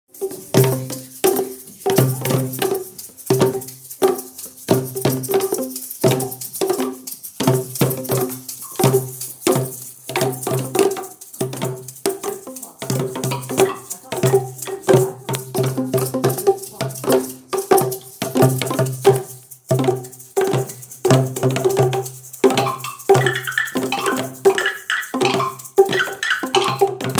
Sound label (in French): Batucada musique percussions Bresil